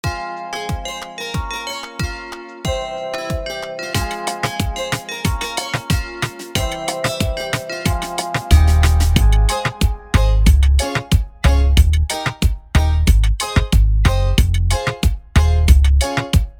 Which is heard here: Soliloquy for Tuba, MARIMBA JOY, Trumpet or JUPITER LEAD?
MARIMBA JOY